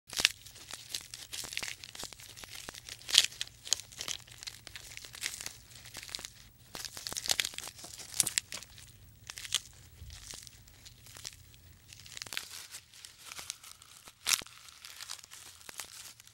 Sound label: Giraffe Eating